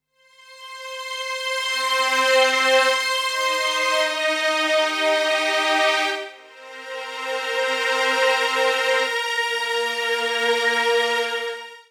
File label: STRING D+B 160 C; eJay; Drum+Bass